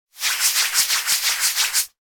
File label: human hand rub belly